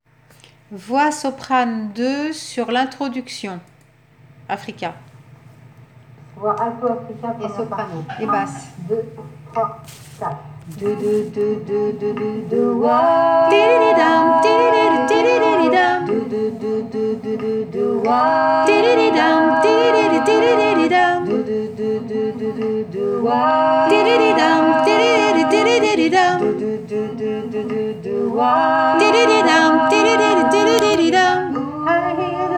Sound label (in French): introduction voix soprano 2